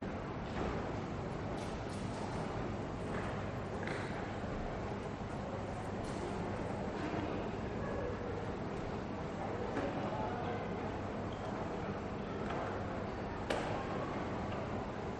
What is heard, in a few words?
piccadilly train station ambience crowd manchester uk